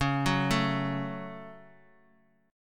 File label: Db Suspended 4th Sharp 5th, Guitar, Standard; 9,9,7,x,x,x; arpeggiated